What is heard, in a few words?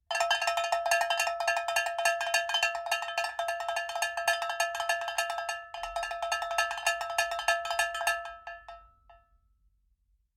cowbell ringing